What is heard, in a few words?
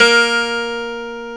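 A#1, MINI 12 GUITAR; AKAI S-Series CD-ROM Sound Library VOL-7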